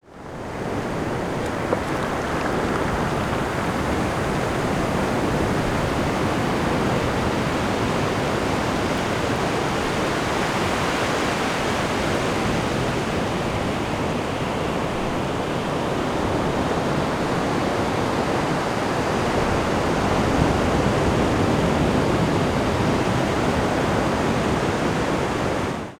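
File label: surf waves waves for surfing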